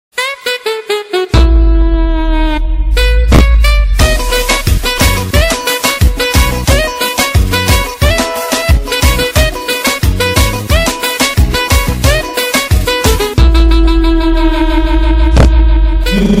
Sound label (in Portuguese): pancadão funk